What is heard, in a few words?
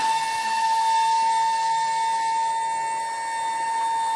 rotor Motor; Kerbal Space Program; KAS